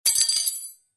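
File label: lojka upala na pol, spoon fell on the floor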